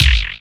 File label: KIK WIGGL, Dance Kicks; Roland L-CDX-01